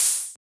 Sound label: Open Hat; FLPRO